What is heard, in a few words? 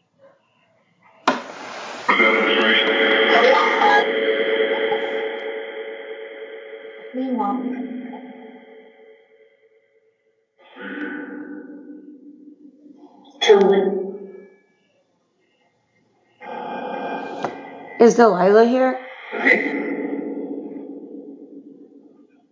Train Station Chessies Room Phasma Box; Mars Train Station – Return Investigation 2024